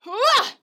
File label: woman attack; soundscrate